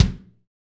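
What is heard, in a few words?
bass drum, Roland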